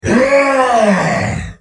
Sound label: attack grunt; cstrike; war3source